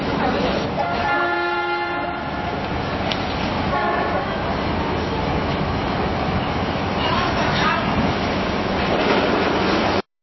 subway noises

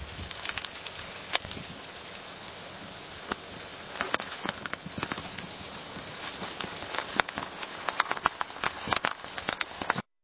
crackling fire

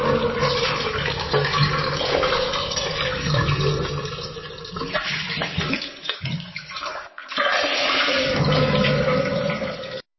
cavern noises; CREEPY DRIPPING

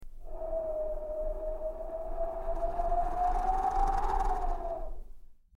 Wind blowing

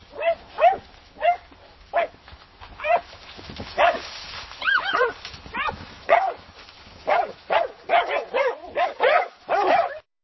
dogs barking

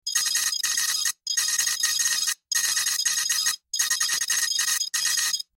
Beeping

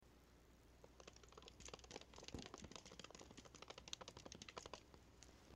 Cat licking milk; CAT PURRING

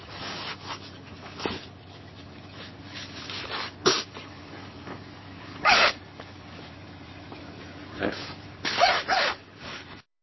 dog sniffing

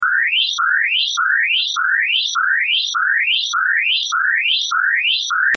Alarms

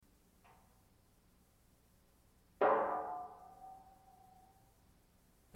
metal creaking

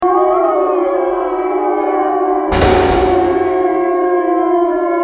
wolf howling; wolves

